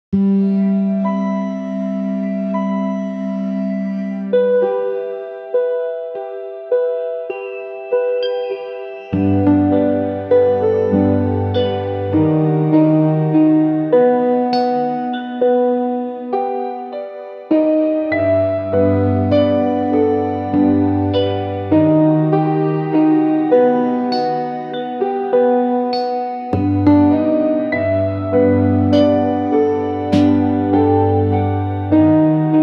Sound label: fantasy lo-fi anime